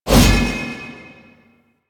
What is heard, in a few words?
Spear Gun Throw Fire; Weapons; Warframe; Tenno; Hoplite